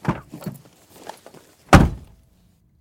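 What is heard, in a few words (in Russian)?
громкое нервное открывание ящика стола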